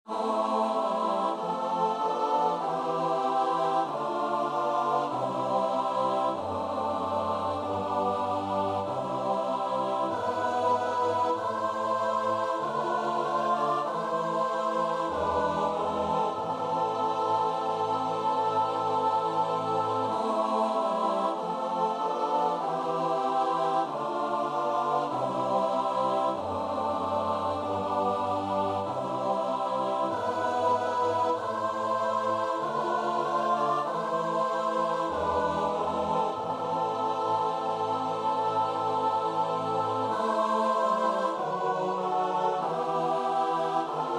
st matthew passion, choir; bach

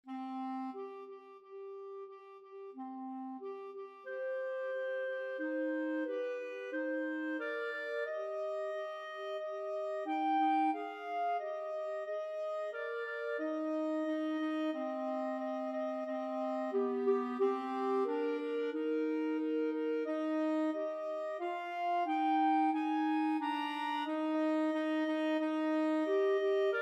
clarinet duet

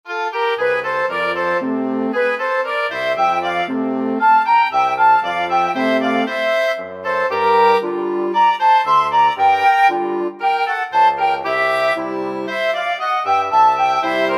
wind quintet

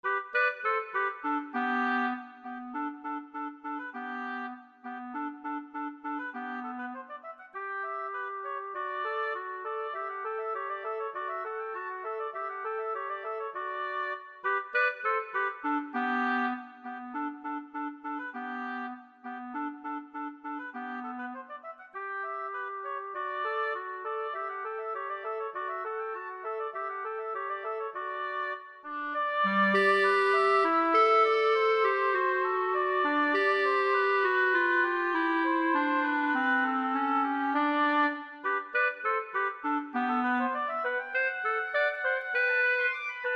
clarinet-oboe duet

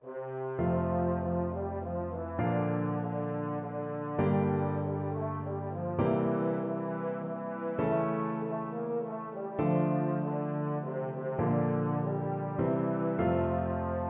euphonium

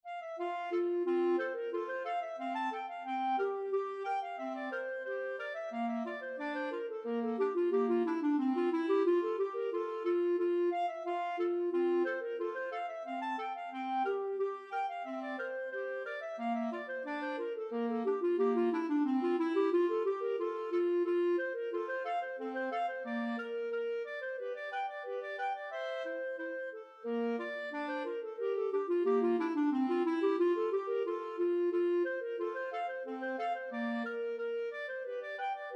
sailors hornpipe 2 clarinet

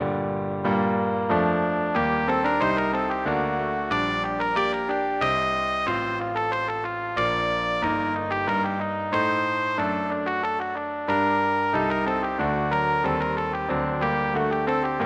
purcell rondeau, trumpet

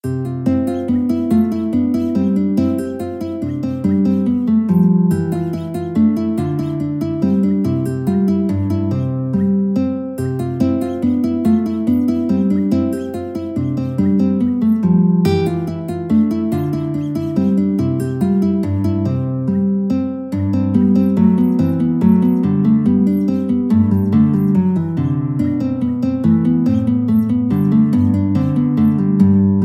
Minuet, guitar

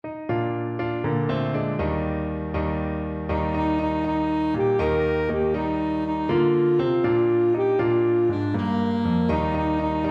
mulberry bush, saxophone

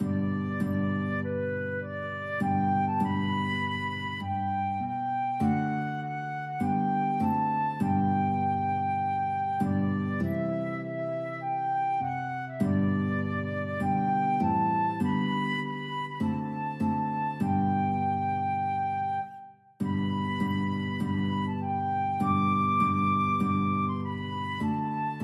guitar flute duet

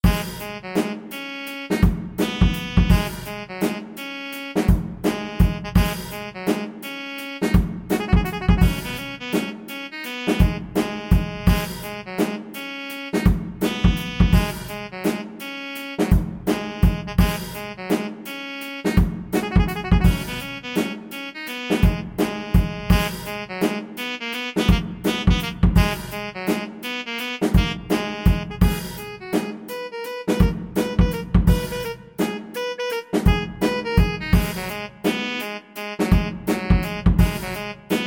Funk Study, saxophone

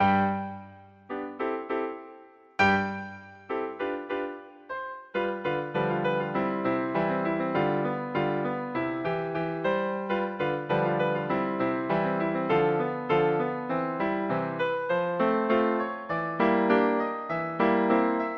pizzicato polka, piano

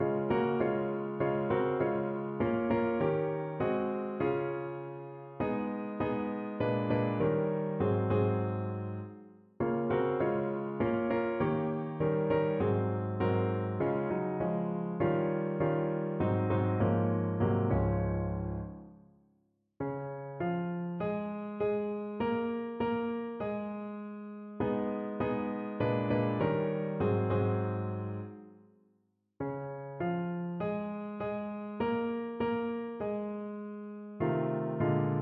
rise up shepherd piano

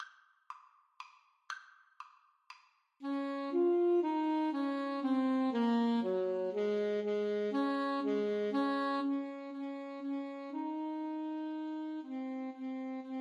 Menuett, Alto Saxophone Duet

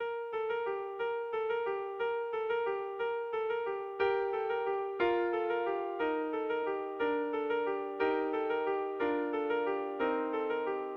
carol of the bells, alto sax quartet

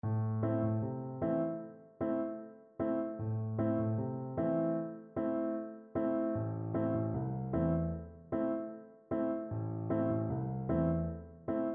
reggae

